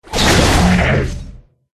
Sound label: Twoheaded Dog